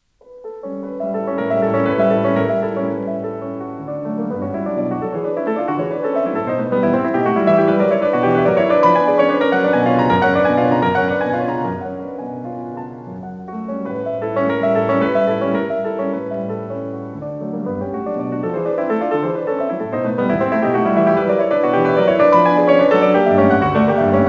Preludes; Chopin; Op28; Lympany

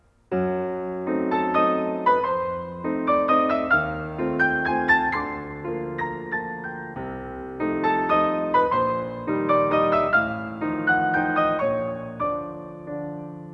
Carnaval for piano; Schumann; Op9; Rubinstein

